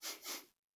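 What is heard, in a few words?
furniture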